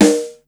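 snare ludwig, Drum Machines, Yamaha Motif ES-Series; 300 Drum Machines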